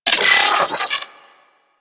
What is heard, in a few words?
Arachnoid Micro Vocal Pain; Warframe; Corpus